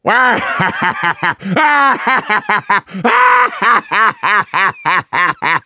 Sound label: laugh; wario